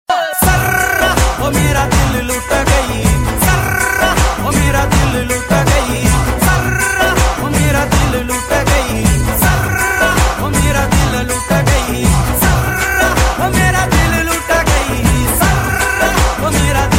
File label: ringtone; iphone5